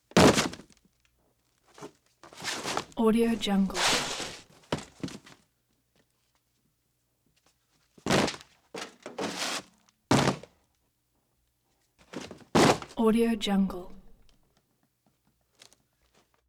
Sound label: wild box crushes cardboard crunchy impacts